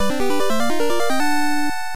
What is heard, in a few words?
Area Clear; gall force eternal story fds